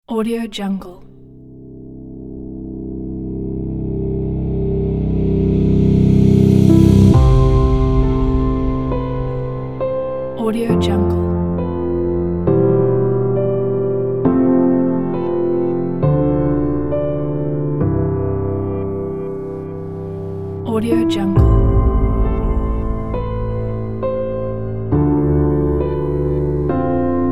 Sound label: emotional piano trailer